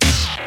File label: Hits & Explode